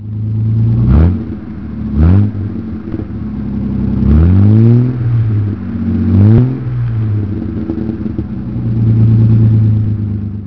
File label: healey